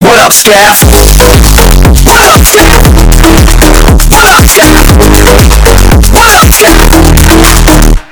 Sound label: bass boosted